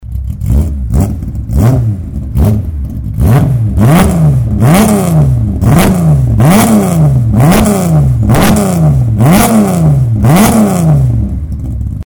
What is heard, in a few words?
Racing Sound